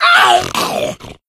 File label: hunter pounce miss, voice; player; source engine; left 4 dead 2